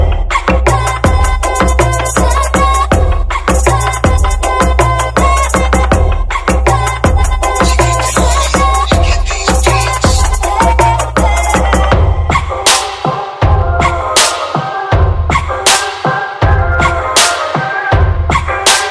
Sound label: Beats